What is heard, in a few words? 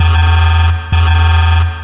alarm